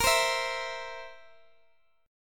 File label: Bb Suspended 2nd Flat 5th, Guitar, Standard; Bbsus2b5 strummed; x,x,x,15,13,12; JGuitar